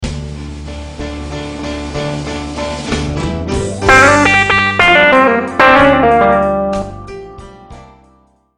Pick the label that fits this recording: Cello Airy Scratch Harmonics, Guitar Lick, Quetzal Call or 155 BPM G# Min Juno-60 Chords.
Guitar Lick